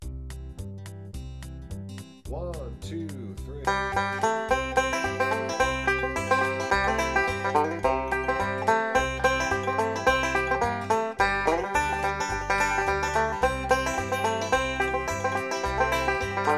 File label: C tuning, banjo; John Henry